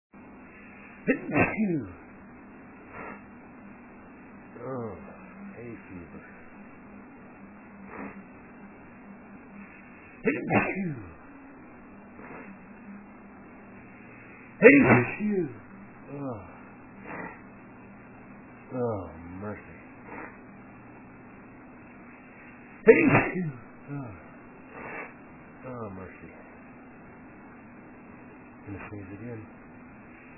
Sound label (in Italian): Sternuto, male